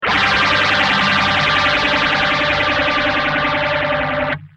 gtr

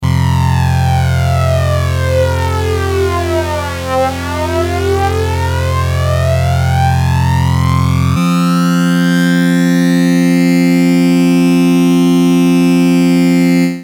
prodigy mxr fd

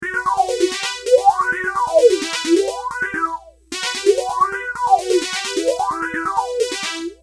phaser

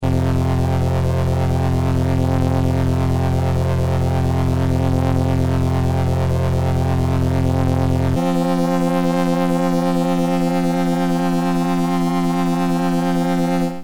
prodigy voce spin

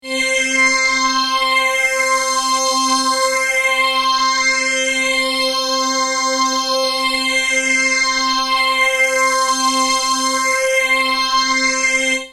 maestro ps1

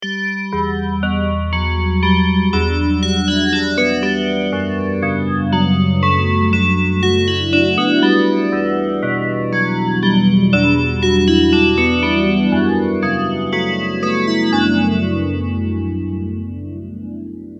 DX7 bells phase

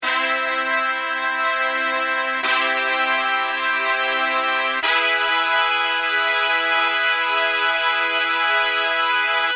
JD990 brass, MXR stereo chorus

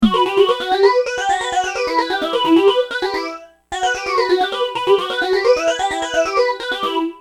PH350; x5dr

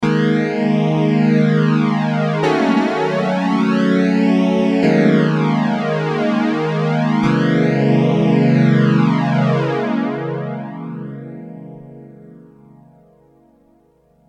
MXR FD

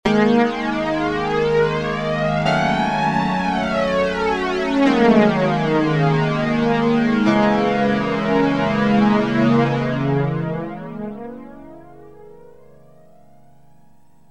m1000 dod r870

